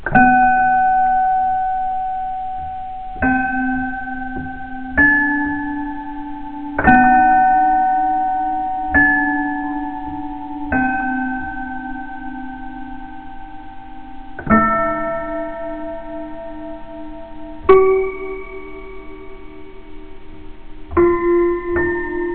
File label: piano